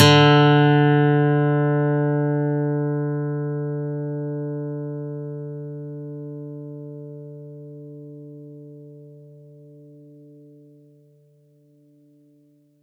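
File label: plucked steel string D3; Polyend; Simulacrum Sounds; 1 Shots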